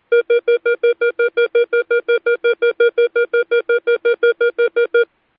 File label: Stutter Rapid Intermittent Horn 470Hz